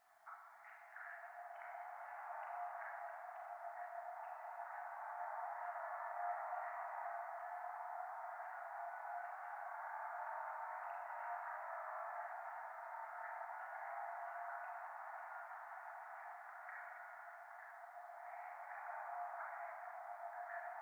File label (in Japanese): 廃墟 フェラチオ 車の中